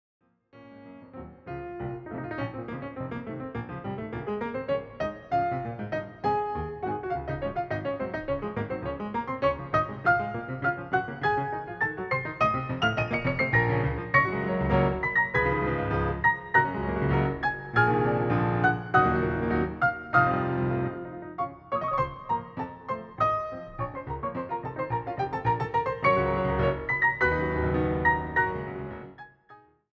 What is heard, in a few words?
Hungarian Dance in B-Flat Major- Allegretto grazioso; brahms; 21 hungarian dances; WoO 1